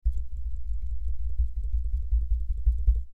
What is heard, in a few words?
Rumble Card